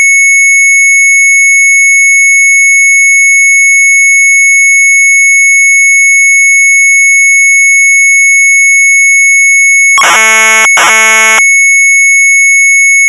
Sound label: MZ800 Tape; GRAPH HILLS; sharp